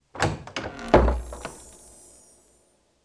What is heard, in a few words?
Treasure Chest open; BossRoom